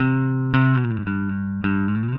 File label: Bass, Indie Rock; Session 01 - Brighton; Sonic Foundry (Sony Creative Software); Indie Rock Anthems